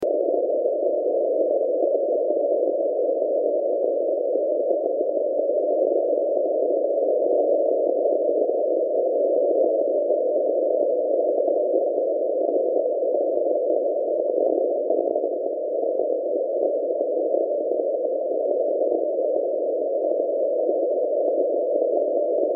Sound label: 63.9 Sainte-Assise France; FTA63